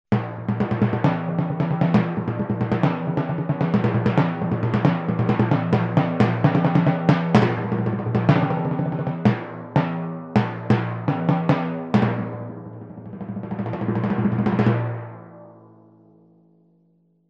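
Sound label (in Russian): торжественный звук барабанов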